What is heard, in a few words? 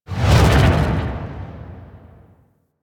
Invisibility Exit; Warframe; Loki